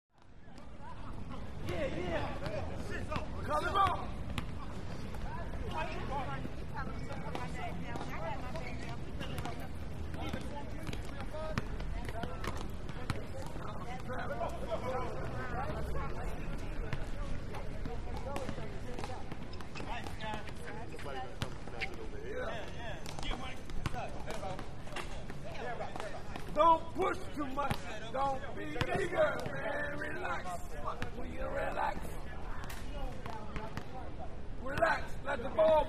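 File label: City Basketball Exterior; big city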